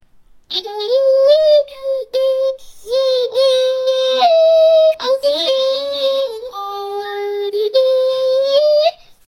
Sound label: childhood music